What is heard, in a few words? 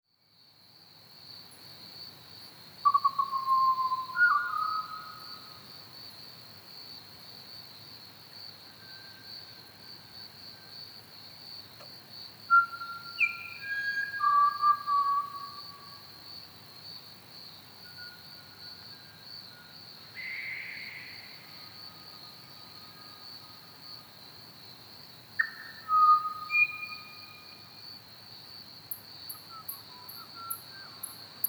Pied Butcherbird Ormiston Gorge